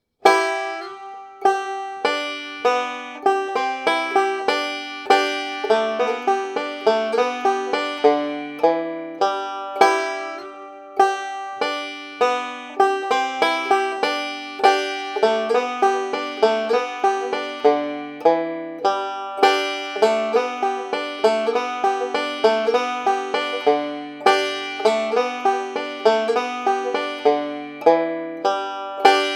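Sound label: cripple creek 100bpm, banjo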